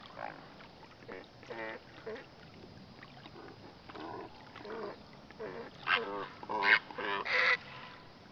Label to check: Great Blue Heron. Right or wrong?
Right